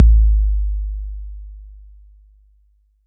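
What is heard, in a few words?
bass drum 808 Decay E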